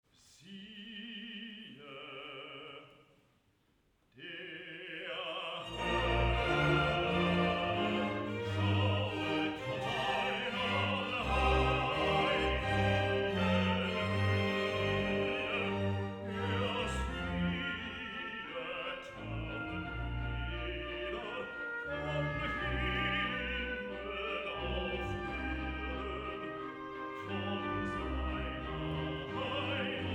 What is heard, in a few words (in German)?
Cavatine und Recitativ